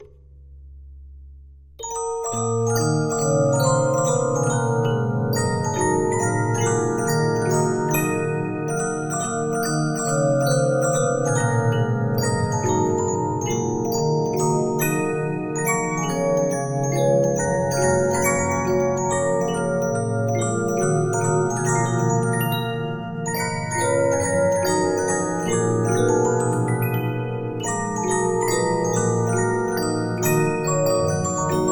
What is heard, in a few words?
Regina, 15inch disc musicbox